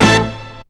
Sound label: HIT, STAB; SoundFactory; SMPMANIA